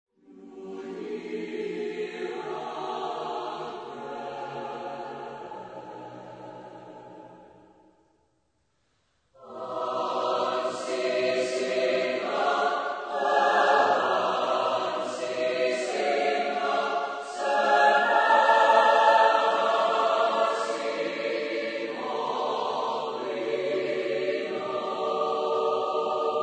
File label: hymn